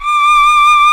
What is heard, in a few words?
KO-Q D4, KOKYU; AKAI S6000 CD-ROM - Volume 1; Strings